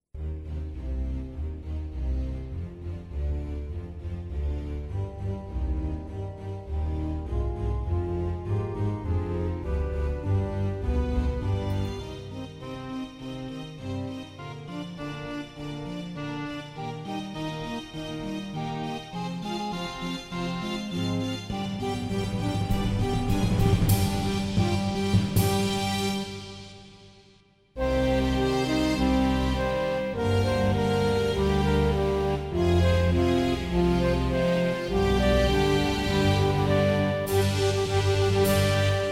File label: instrumental